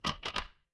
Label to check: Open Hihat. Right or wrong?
Wrong